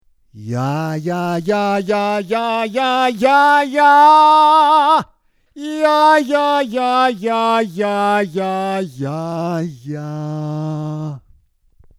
150 Impedanz Normal Plate

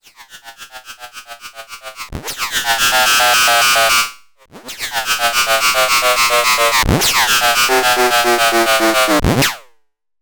OSC FM Hirnsaege; GRP Module

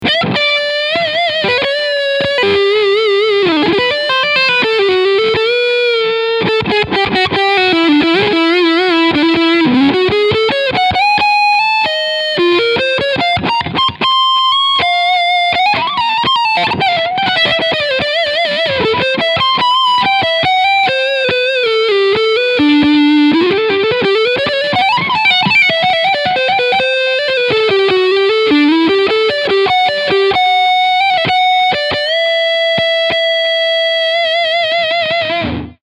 Jackson JS32 Dinky E Gitarre Leadsound Front PU

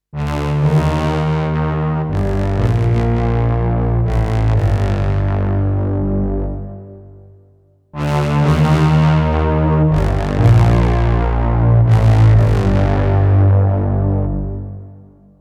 Novation Summit Unisono Brass

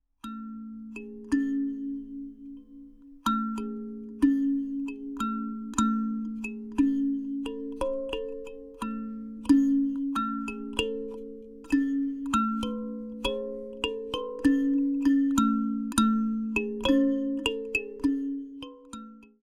Kalimba nah

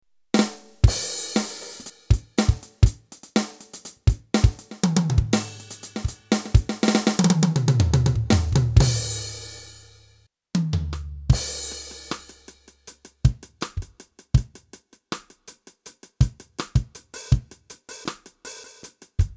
Yamaha DD-75 Akustische Drumsets

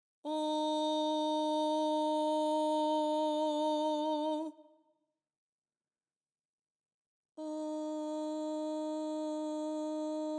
dunkel normal hell

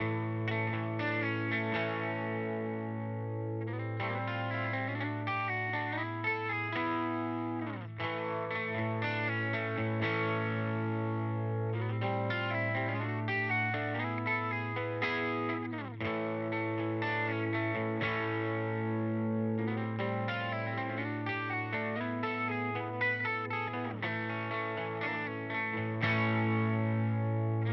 HX Stomp Deluxe Reverb Kompressor; Vergleichstest

